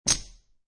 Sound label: Poker Chips Three Chips Dropping On Felt; Gambling